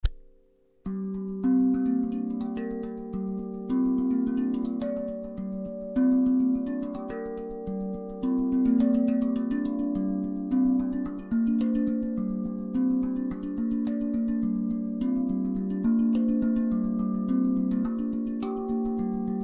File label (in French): sol penta mineur octaves mains, Tank drum; Sol pentatonique mineur 432 hz; osb-drum